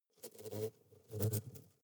hornet hum soft

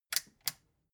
metal button click power on slow

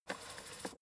fader plastic stutter soft short

